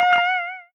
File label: slide